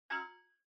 OS Sound (Speaker-Notification)

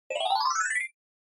Scene Change Fast Long

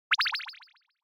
Anime Motion Delay

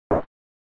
Arcade General (Noise)

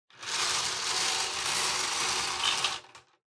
Bingo Machine, Spin Drop

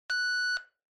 Handheld Transceiver Signal, Rx Beep

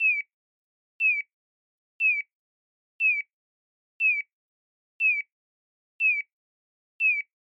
Crosswalk Signal Loop

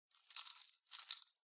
Pocket Watch Chain Noise

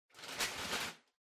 Packing Paper Far Rustle; 梱包紙; 遠い ガサガサ; 梱包材

